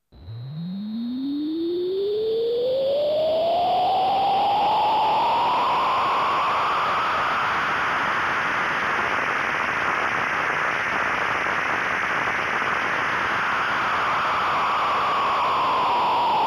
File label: synth noise